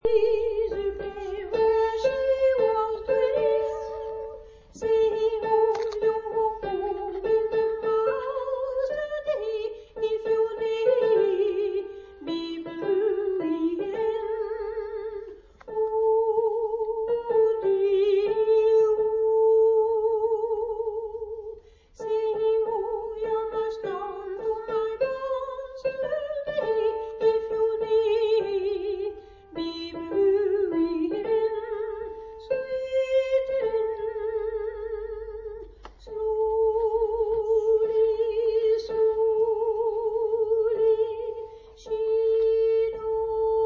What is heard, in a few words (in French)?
soprane; mes 24 50 soprani; Folk scottish song; Barb'ry ellen